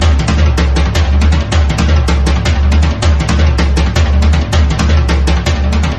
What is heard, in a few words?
drums; horde